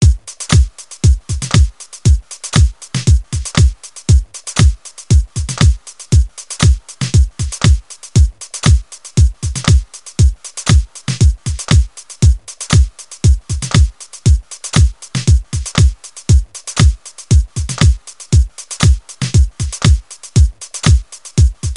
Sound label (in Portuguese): toque techno; toques de chamadas